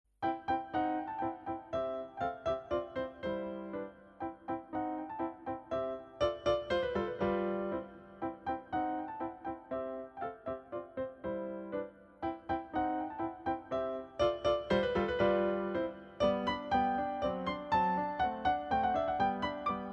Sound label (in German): diabelli klavierstueck